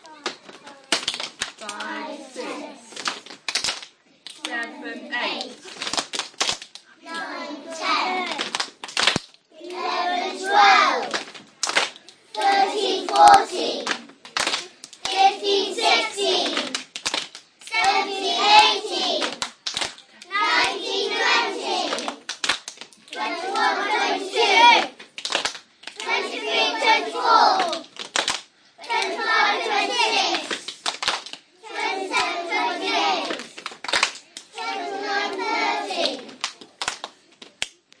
counting on in 1s with clapping rythmn year 1, maths songs